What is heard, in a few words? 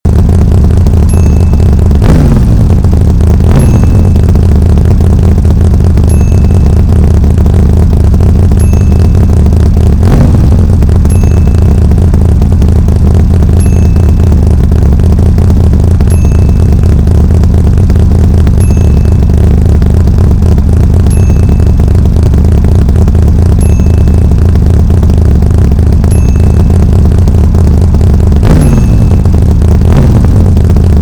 Race Car